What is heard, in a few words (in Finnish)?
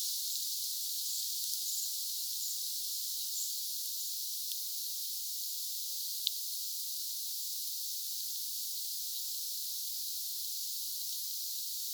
tilhia; Tilhet muuttavat nyt aktiivisesti.